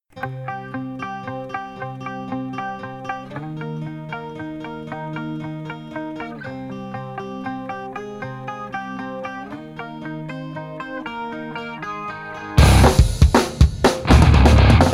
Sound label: apple earpods eq